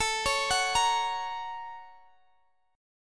A sharp Minor Major 7th Sharp 5th A

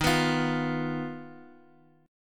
D sharp Suspended 2nd Sharp 5th, Guitar, Open D; 13,14,15,x,x,x; chordshape

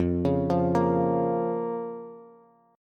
F Suspended 4th Sharp 5th F, Bass

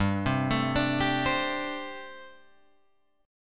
G Suspended 2nd Suspended 4th G, Guitar, Open F; Gsus2sus4 arpeggiated; JGuitar

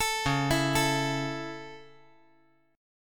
Db Minor Sharp 5th Db; arpeggiated; Dbm#5; JGuitar

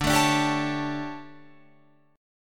D Major 7th Suspended 4th, Guitar, Open F; DM7sus4; strummed

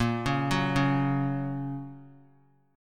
Bb Minor Sharp 5th; arpeggiated; JGuitar

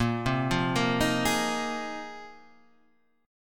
Bb 7th Suspended 2nd Suspended 4th; 6,3,3,3,4,4; Bb7sus2sus4 arpeggiated

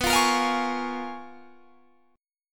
Bb Minor 7th B; Bbm7/B strummed

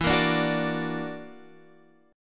F sharp Augmented 7th E, chord

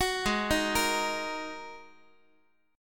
G sharp 7th Suspended 2nd G sharp Guitar; G#7sus2 arpeggiated; x,x,9,6,7,9; JGuitar

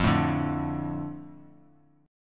F 6th F down Bass Standard; F6 strummed

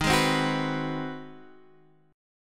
G sharp 7th Sharp 9th D sharp

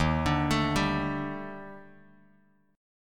D sharp Major Sharp 11th, Bass Standard; D#M#11 arpeggiated; JGuitar

